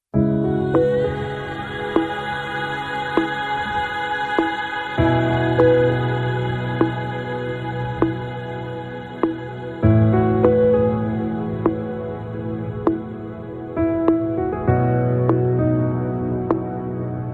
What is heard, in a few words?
Ringtone